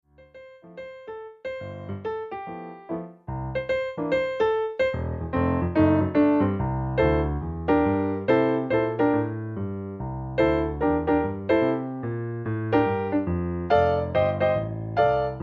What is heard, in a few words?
jazz warm up